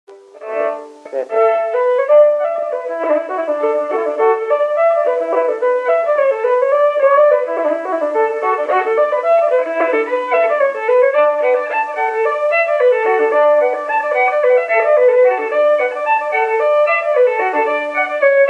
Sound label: pitched up, reels; Denis Murphy plays Hickey’s; Top 40 of Sliabh Luachra project